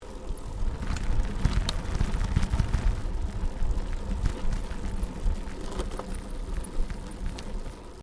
Bicycle Riding Wooden Bridge Stereo, 21 speed road bicycle; Giant Crx3; Bicycles